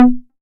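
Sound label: 808 mid conga Tape Sat, Tape 808, Conga; Goldbaby Tape808 & Tape909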